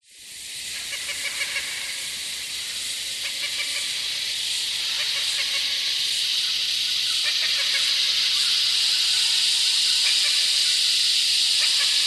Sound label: nature rainforest evening cicada insects birds distant echos; zapsplat